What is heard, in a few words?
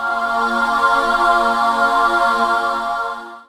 PAD Dance; Best Service Gigapack 1